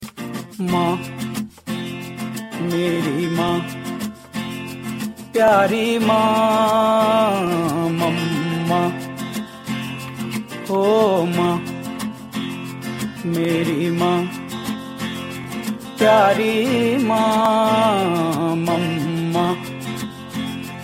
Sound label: bg music